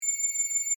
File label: Scanner Read Out, Scifi; Science, Fiction; Tech Sound Effects; BlastWave